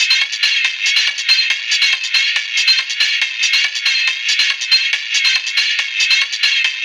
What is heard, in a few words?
Metallic Perc